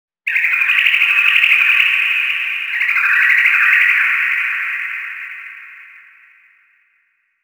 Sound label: environment creature chirp